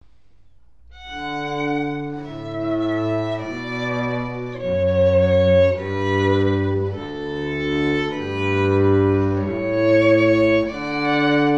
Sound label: strings canon; carneval